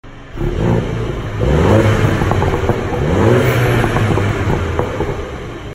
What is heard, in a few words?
Cooper exhaust